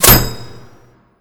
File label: Hit; Hit Sarco1